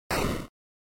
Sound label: NES General Noise Phrase